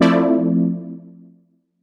stab housed, stabs

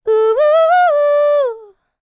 LICKS, Vocal, R&B; ILIO; Dance; LT R+B

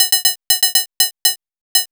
MOOG HAT; AMG - Vince Clarke Lucky Bastard

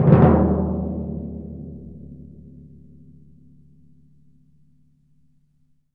TMP, Synclavier Percussion

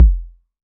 volca beats kick; Korg Volca Beats

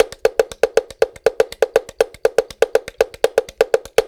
120, Coil Conga; Spectrasonics - Supreme Beats - World Dance

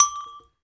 TAP, Perc Toys; Spectrasonics; Supreme Beats; World Dance

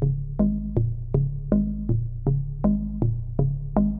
SH101 Bass Loop (120BPM), retro house

